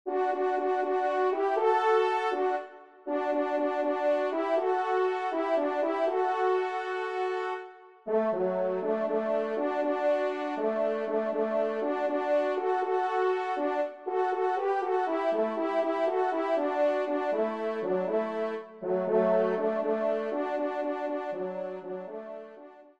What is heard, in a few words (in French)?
marche triomphale, trompe; 2e Trompe; Marche Triomphale des Louvetiers; MM. de Beauvais de Saint-Paul